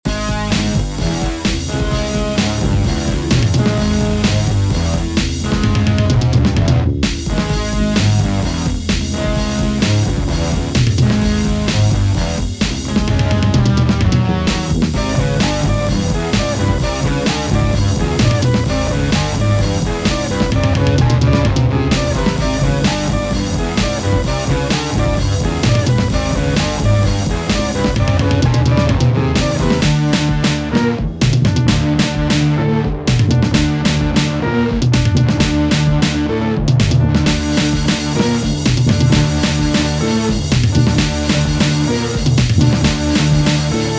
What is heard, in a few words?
song metal